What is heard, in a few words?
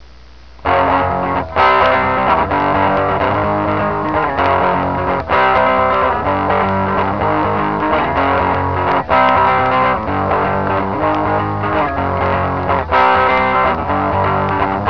punk